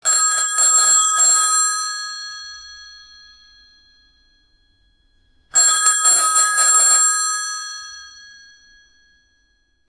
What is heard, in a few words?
phone ring